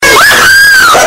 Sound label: Scream